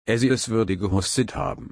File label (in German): husten